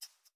兔子待机声

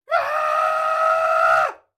山羊尖叫冲撞前声

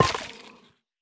幽匿催发体破坏声

